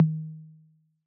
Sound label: Analog Tom; Yamaha DJX-2B